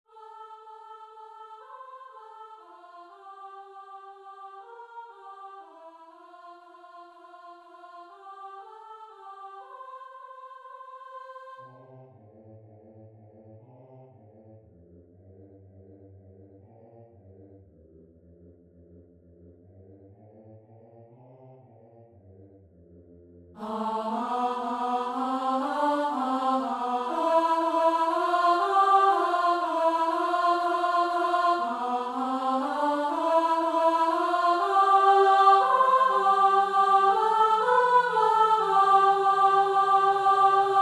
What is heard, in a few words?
bass